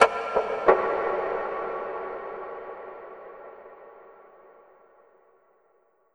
FX Impact

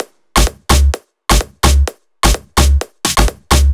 Loop, 128 BPM

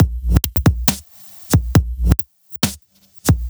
Bpm137 Break, Dubstep, Drum Loops; Decay

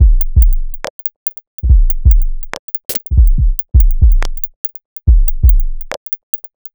Underwater Break, Bpm 142, Dubstep, Drum Loops

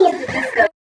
G# VOX STAB; funky; Loopmasters; Funky Underground Vol 2

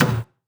Toms

